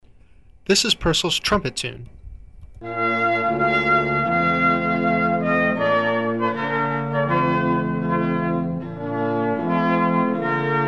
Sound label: trumpet, string; purcell; String Serenade